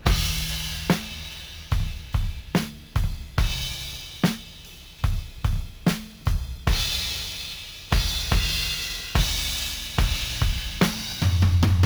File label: Drums only